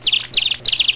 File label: BIRD